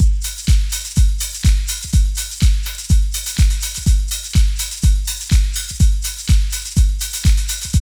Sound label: LOOP, Tekno Industrial; East West